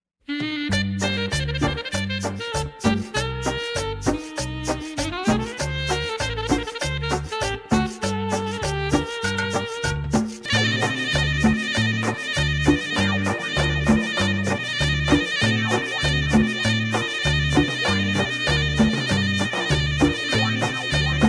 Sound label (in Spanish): ringtone